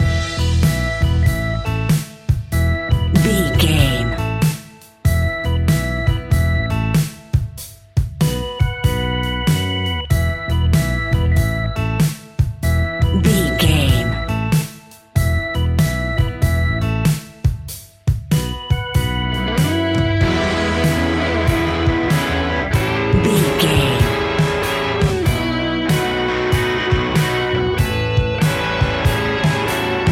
A rocking music track with rock guitars, heavy rock drums and thumping rock bass lines. Heavy, loud, aggressi